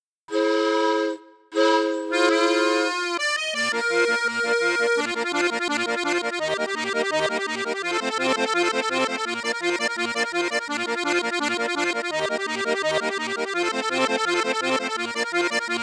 registre flute juste flute haute, accordeon; cavagnolo vedette 5

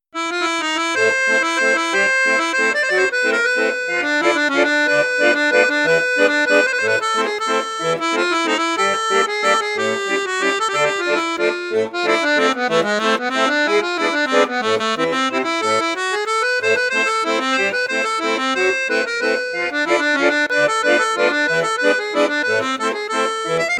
registre flute juste, accordeon; crucianelli

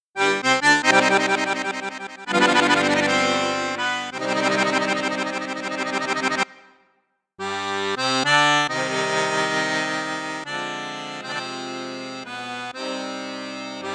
registre 16 8 4, accordeon; cavagnolo artiste 3

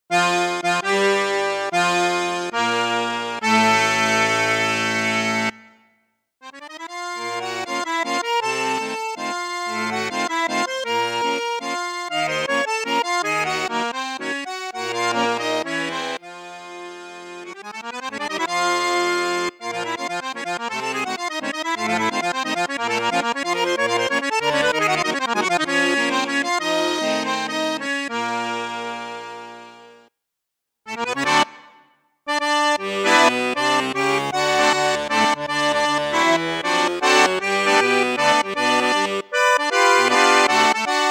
registre basson flute juste flute haute piccolo, accordéon; cavagnolo professionnel

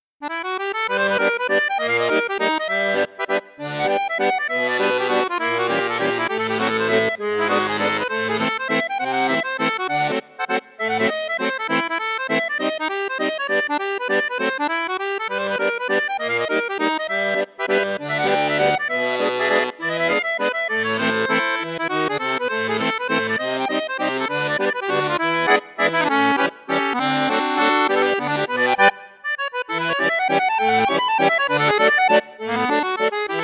registre flute juste, accordéon; fratelli crosio super musette

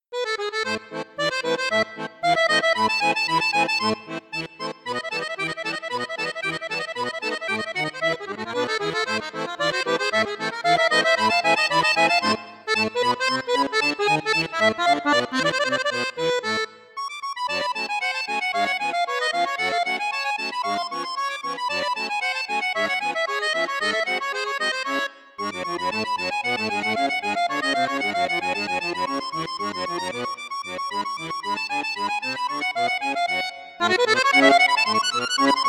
registre 8 8, accordeon; borsini concerto medio

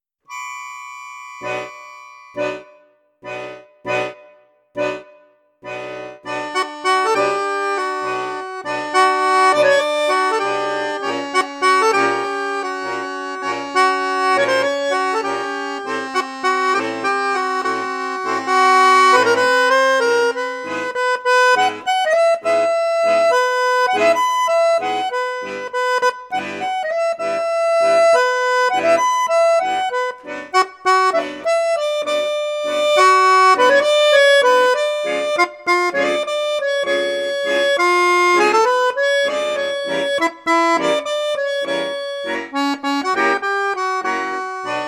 registre basson flute juste, accordeon; crucianelli king

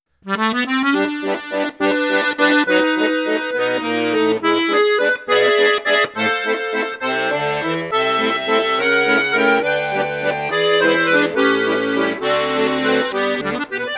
registre flute juste flute haute, accordeon; piermaria prodige plus

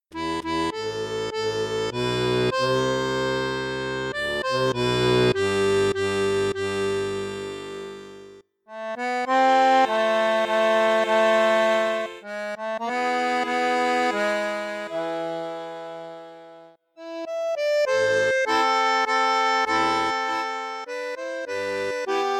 registre basson piccolo, accordeon; cavagnolo vedette 10

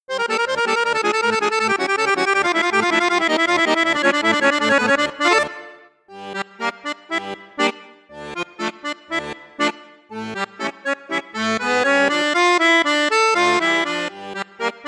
registre basson flute juste, accordeon; cavagnolo professionnel 10

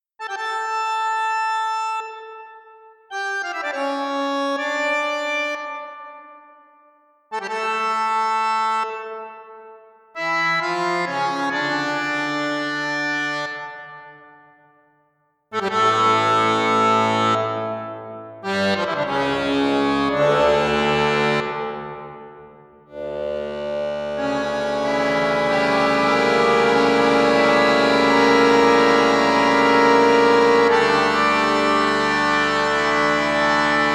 registre 16 8 8 4, accordéon; toccata et fugue bwv565 toccata; borsini bayan

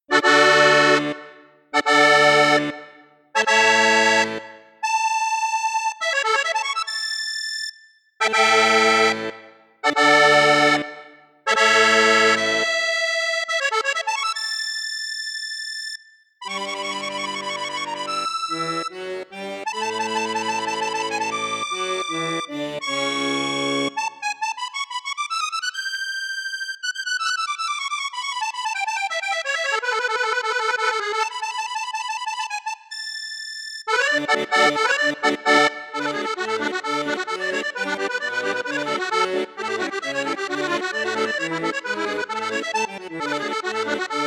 registre flute basse flute juste flute haute, accordeon; piermaria 324

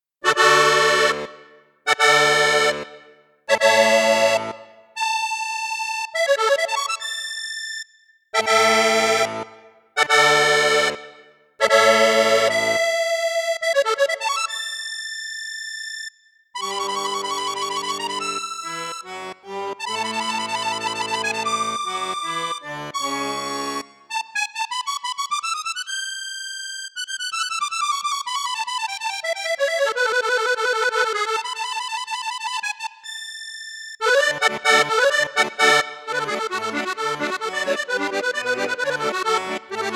registre flute basse flute juste flute haute, accordéon; cavagnolo royal musette

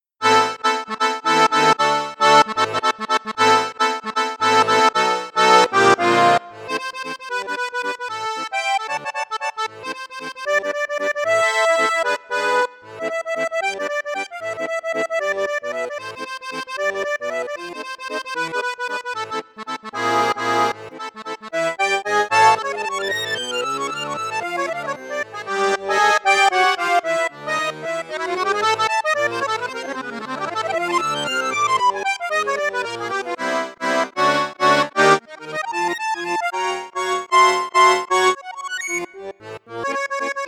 registre flute juste flute haute piccolo, hohner morino artiste accordeon; hohner morino artiste vi d

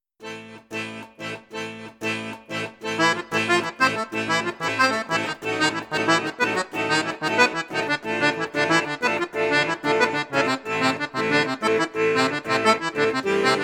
registre basson flute juste, accordeon; perle d or

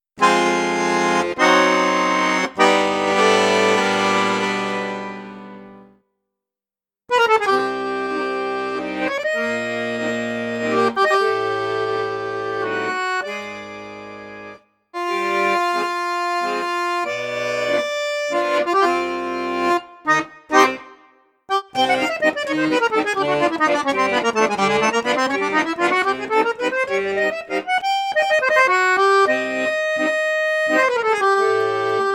registre basson flute juste piccolo, accordeon; crucianelli super king

